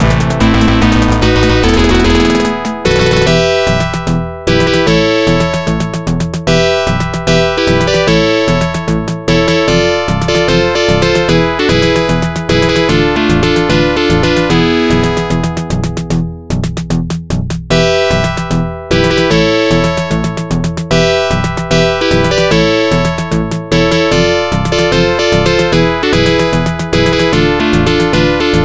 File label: background music; arcade; commando